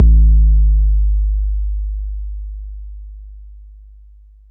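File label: bass drum 808 Smooth C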